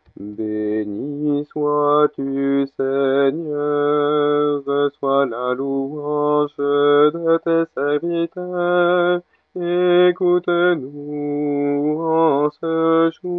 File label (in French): alto; MPE Benis sois tu Seigneur; mariage